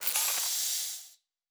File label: Door, Sci-Fi Sounds